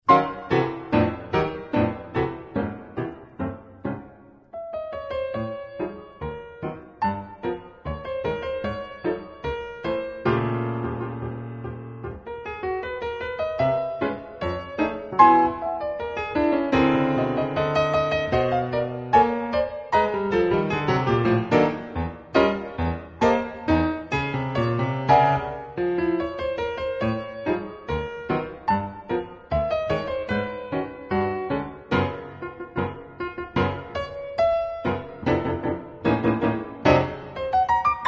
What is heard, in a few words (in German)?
Bauernmarsch, Konzertpianistin; Skalkottas